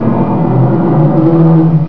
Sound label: garg attack